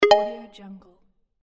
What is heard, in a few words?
collect item